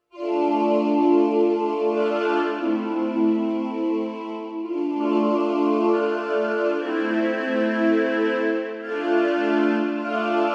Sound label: Strymon Big Sky Chorale Strings